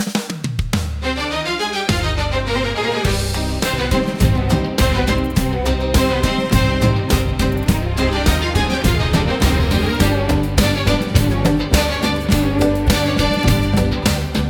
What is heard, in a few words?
background music